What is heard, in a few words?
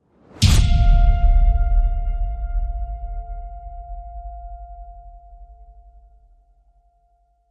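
hit horror; Eerie Passenger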